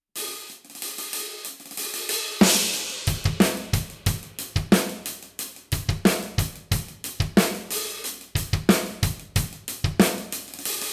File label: 16 Extra Dry Medium Thin HH